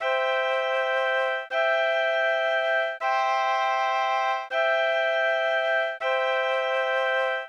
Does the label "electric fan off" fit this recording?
No